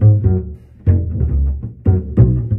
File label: bass, Loops